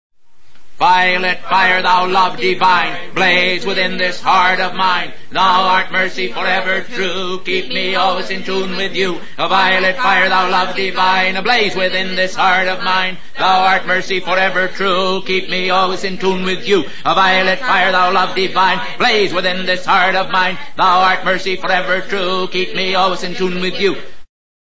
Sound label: heart